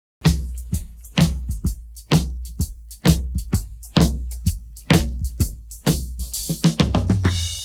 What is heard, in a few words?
drums pattern